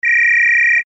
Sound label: phone electronic ringing